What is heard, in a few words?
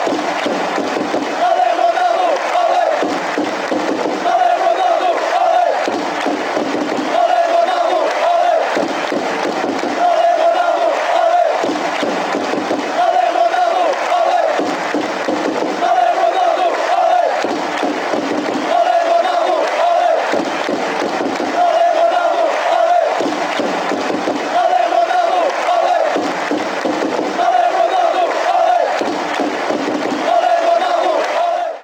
ole ole; ronaldo